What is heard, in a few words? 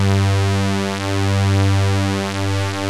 MULTI SYNTH